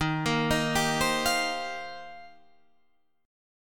Eb 6th Add 9th, Guitar, Open D

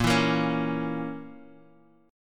Bb Diminished Bb Guitar Half Step Down

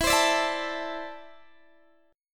E 7th Suspended 4th Sharp 5th, Guitar, Open D

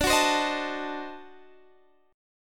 D 7th Flat 9th D, chord

